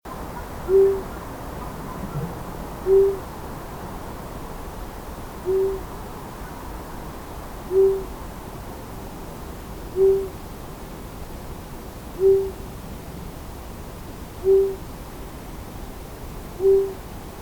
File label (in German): waldohreule